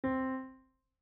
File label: C4, AmericanConcertD; piano; vel64